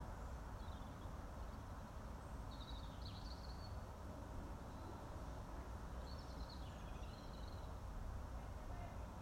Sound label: Robin